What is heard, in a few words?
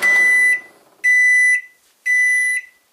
microwave done beep; Machines